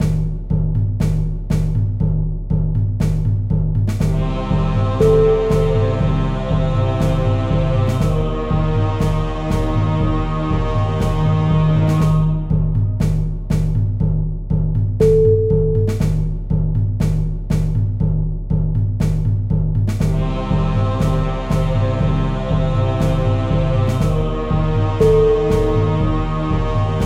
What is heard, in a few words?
ominous march